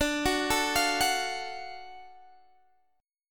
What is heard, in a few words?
Gb Minor Major 7th Sharp 5th, guitar